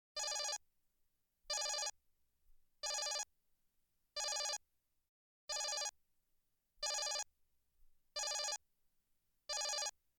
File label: Distress Call Alert; Star Trek Generations